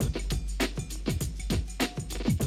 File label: drum loop, 100 BPM